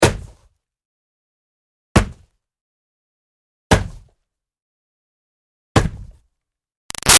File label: Hockey Hits Body Check Tight; Sports